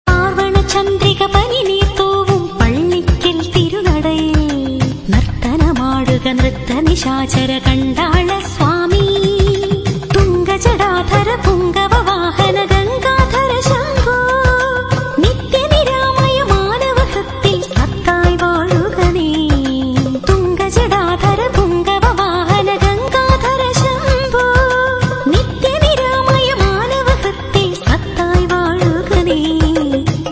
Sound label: devotional; Religious